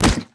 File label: hit body; impacts explos